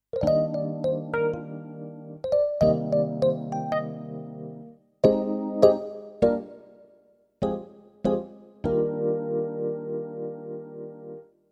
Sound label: Thomann AK X1100 Vintage EP